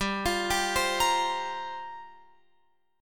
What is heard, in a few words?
G Minor 11th G down Guitar Open F; Gm11 arpeggiated